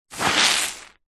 household sponge large wet soapy foamy squeeze squelch; zapsplat